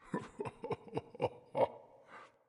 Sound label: lobby defeat cyclops vox; smite; osiris